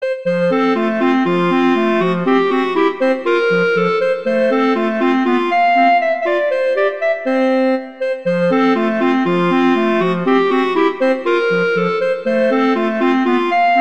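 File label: Duet, horn; Mozart Duets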